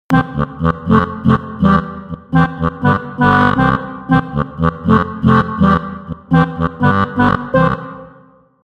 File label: Clarinet Stretch